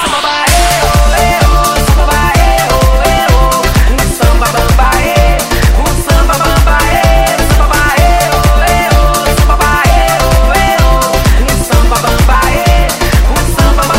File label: Samba